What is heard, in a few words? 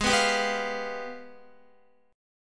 Ab Minor Major 13th, down, Guitar; strummed; JGuitar